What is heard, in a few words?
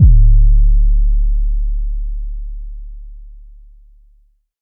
phonk kicks oneshot 808 D; trap kick drums